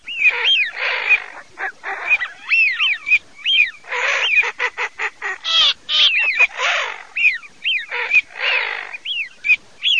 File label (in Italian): ALZA FISC